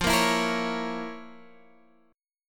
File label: F Major 7th Suspended 4th Sharp 5th, Guitar, Open F; FM7sus4#5; 17,17,16,17,16,x; strummed